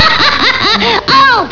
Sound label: HAHA OH